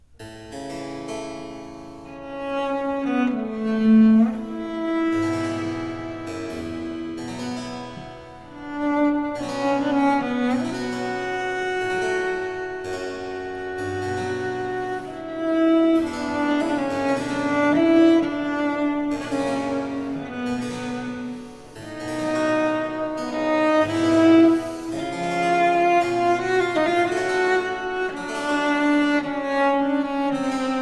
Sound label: Sonata for cello continuo in D minor - Adagio; George Frideric Handel